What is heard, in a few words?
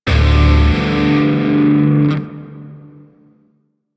Grineer Shawzin Maj Chord E; Music; Warframe